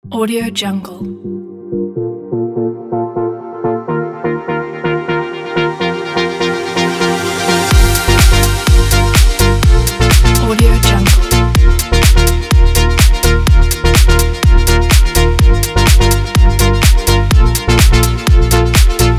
dance house

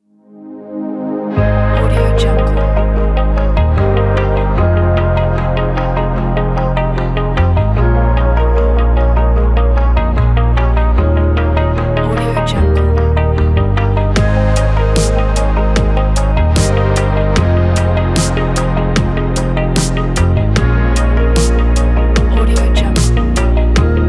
synthwave corporate

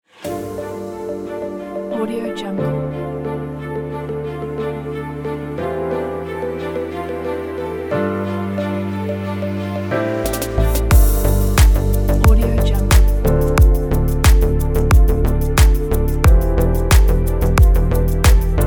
fashion electronic background

